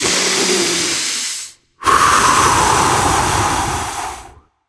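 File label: nonverbal breath; voicepack; smite; merlin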